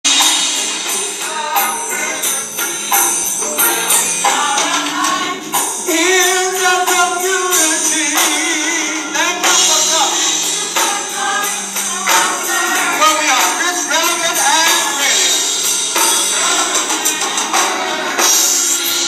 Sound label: church song